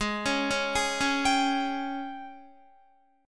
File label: G sharp Major 7th Suspended 4th, Guitar; G#M7sus4 Chord